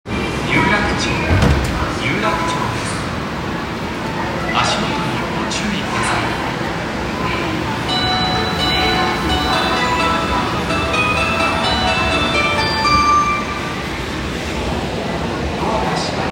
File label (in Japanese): 有楽町線有楽町西, train sounds